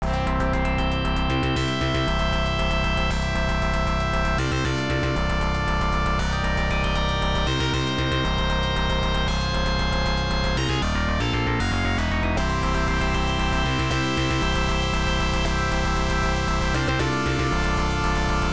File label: Game Clear BGM; Thunder Ceptor